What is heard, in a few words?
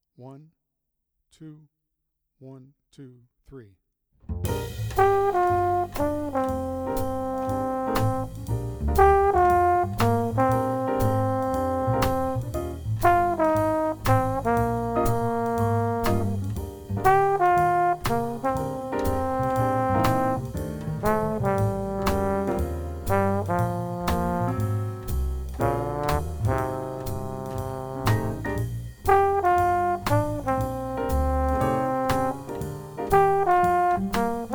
trombone audio sample